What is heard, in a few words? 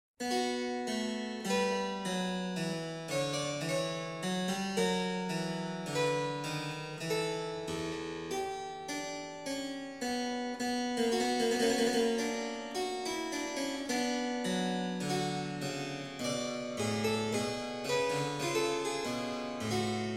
Keyboard Sonata in E-Flat, Largo, Presto